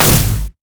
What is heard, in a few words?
grenade; rumble